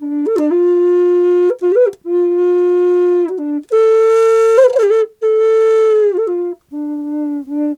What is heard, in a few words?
D wild flute; Big Fish Audio - Didgeridoo and Other Primitive Instruments